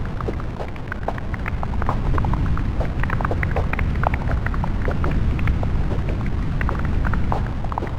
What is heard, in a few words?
fire, ambience; rust